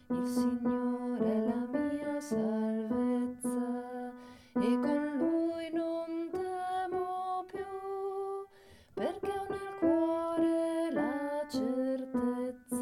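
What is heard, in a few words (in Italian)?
Cantico Dei Redenti, contralti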